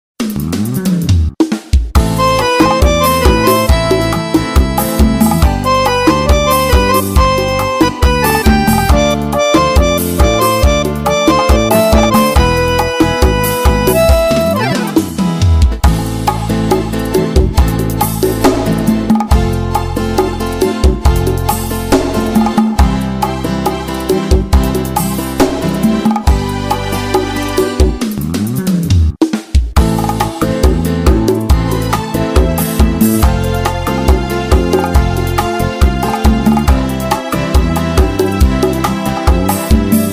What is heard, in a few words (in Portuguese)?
arrocha